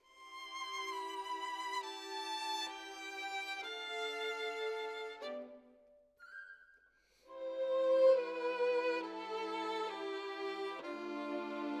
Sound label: Violin Solo